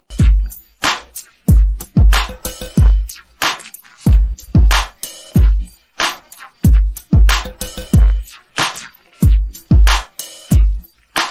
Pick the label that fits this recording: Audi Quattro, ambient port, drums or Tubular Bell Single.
drums